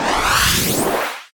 Boost

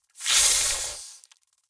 Flying Insects, alerted

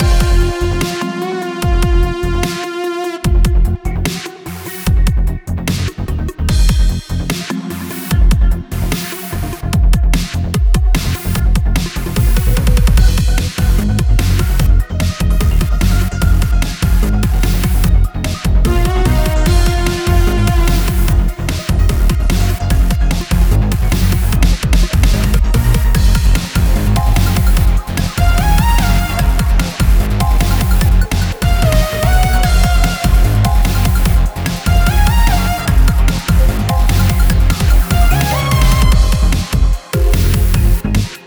Breakout Frantic Mix Loop; Breakout Recharged

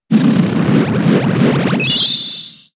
Coil; Pokemon; gen 7; SUMO; USUM

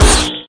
Vehicles; SFX Vehicles Manta

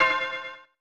Ding; George Foreman's KO Boxing; SNES

